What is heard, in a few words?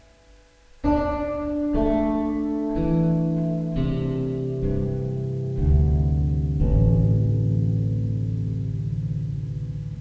sample gamba to everything, violin